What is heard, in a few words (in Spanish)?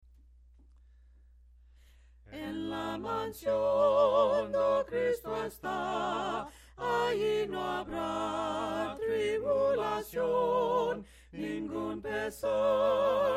En la mansion do Cristo esta melodia palabras